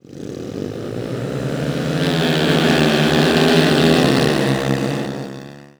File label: Start, UI